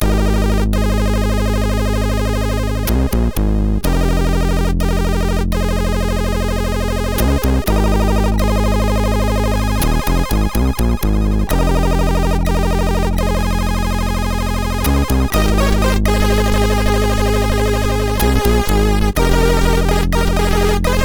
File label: Heartbeat Intro, MOS6581R4